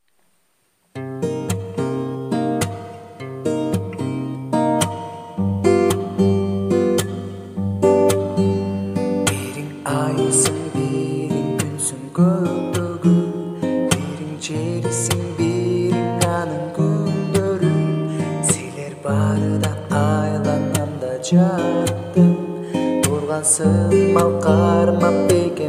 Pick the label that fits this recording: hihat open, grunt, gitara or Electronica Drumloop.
gitara